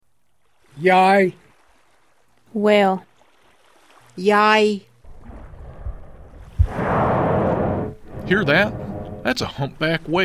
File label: humpback whales; Inside Passage audio guide